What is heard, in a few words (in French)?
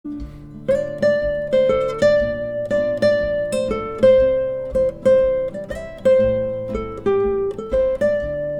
melodie couplet